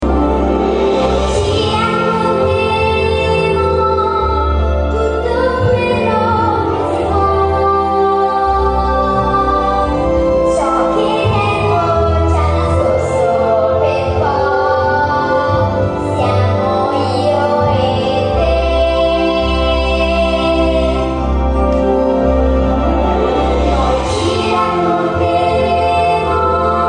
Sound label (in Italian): canto musical; a christmas carol